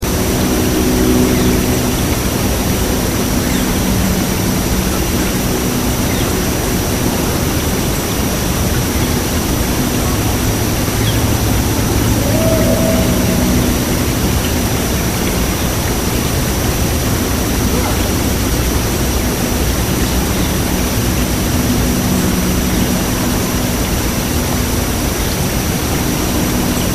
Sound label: whoop